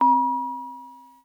elevator synth

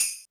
Drums, Kawai K4, Drum Machines

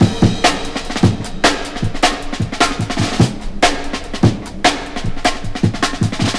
150, Drum Loops; Total Drum&Bass

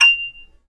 F6 HARP; USB Soundscan vol.62; AKAI

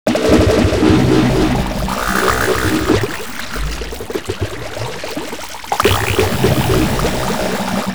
WATER; USB Soundscan vol.33; AKAI; Disjointed Textures

